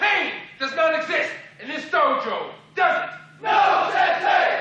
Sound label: pain